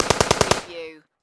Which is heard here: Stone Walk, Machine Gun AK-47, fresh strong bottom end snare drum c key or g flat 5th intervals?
Machine Gun AK-47